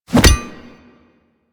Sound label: Wolf Axe Catch B; Warframe; Grineer